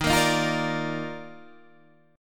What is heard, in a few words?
Eb Major 7th Suspended 2nd, Guitar Standard; strummed; chord; JGuitar